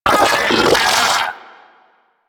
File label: Arachnoid Vocal Pain; Warframe; Corpus; Arachnoid Hunger